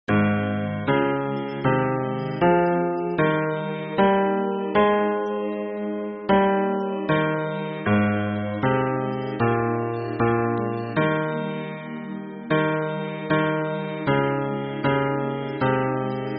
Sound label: hymn bass